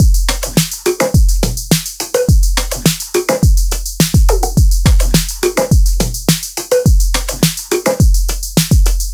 Machine Drum Loop - 105 BPM, Experimental

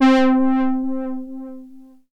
Prophet 5 LEAD Wavey Low - C; One Shots; Cymatics; Fantasy Synth Sample Pack

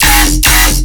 Bass Hit F, Dubstep

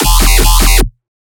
Bass Hits, Dubstep; Advent Calendar 2017 Day 21 - DubstepBass

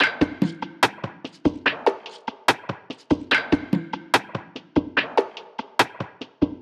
Steady Perc Loop - 145 BPM; Cymatics; Fiji Hihat Loops & Percussion

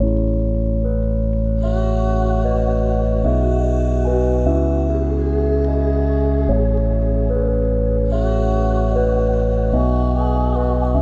74 BPM C# Min, Melody Loops, Lofi; Lofi Collection; Amber Eyes

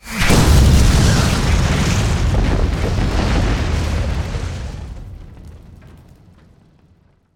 explo bomb; Explosions; PremiumBeat

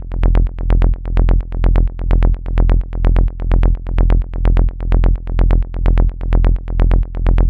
Bass Loop Psy F 128, Melodic Techno; Ghosthack; AC2024 - Day 12 - Melodic Techno

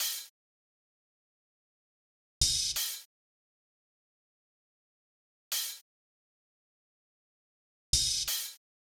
Drum Loop - 87 BPM Open Hat, Boom Bap; Frozen; Cymatics - ANALOG EVOLUTION Beta

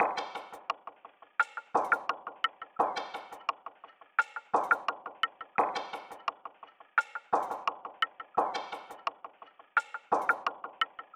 Percussion Loop, 172 BPM; Nico; Percussion Loops

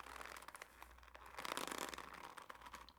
Leather Stretching; Fairlight Sound Lib

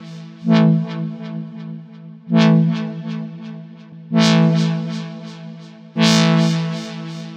Synth Loop 130 A, Techno; Loops; Synths; GhostHack; Ghosthack - Free Techno Sounds 2024

